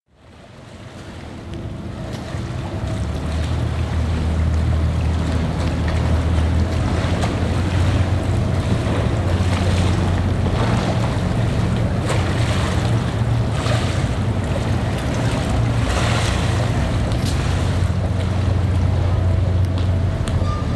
suoni Vaporetto dei Zattere

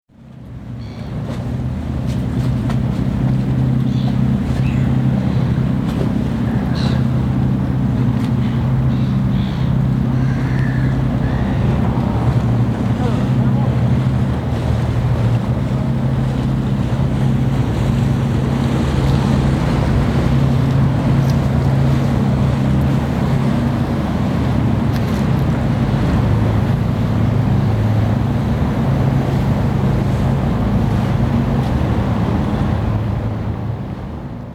campo; suoni; campo Erberia

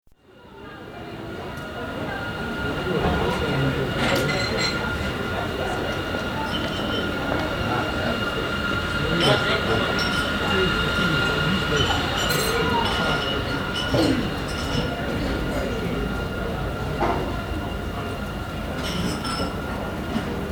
Calle Caffettier; suoni

